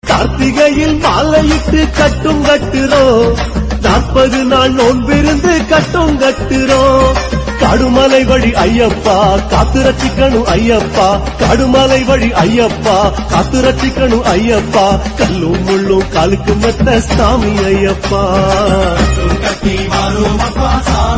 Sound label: Ayyappan Songs, Tamil